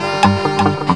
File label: BRASS HIT; Ragga & Dub Reggae; BRASS KIT; AKAI; USB Soundscan vol.27